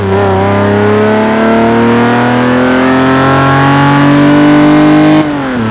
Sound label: second, vehicles; gmod; tdmcars; gullwing